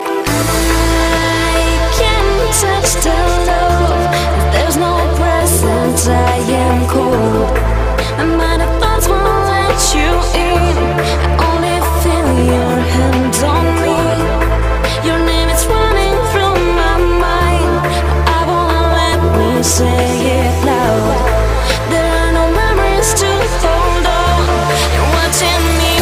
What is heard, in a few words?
House Mix